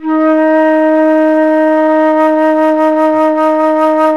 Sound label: Flute; CMI